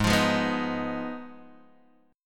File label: Ab Minor 6th Ab, down, Guitar, Open G